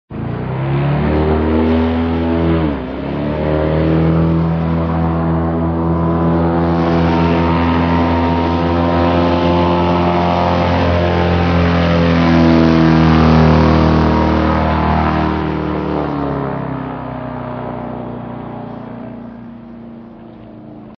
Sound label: Air boat sounds